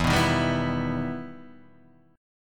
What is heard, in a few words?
E Minor Major 7th E down Guitar Half Step Down